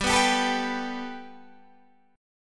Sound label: G Major Add 9th Guitar DADGAD, chord